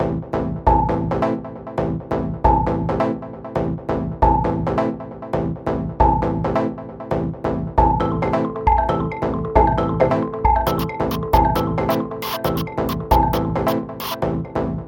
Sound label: Classic Rhythm